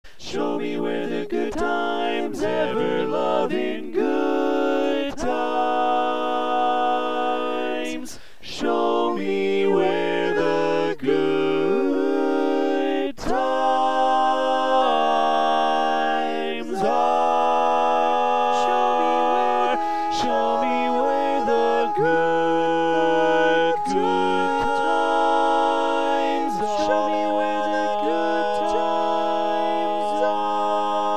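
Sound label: barbershop tags, baritone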